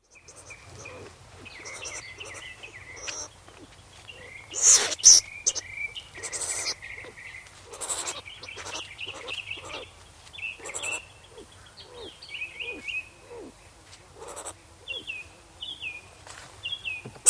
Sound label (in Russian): skuns golos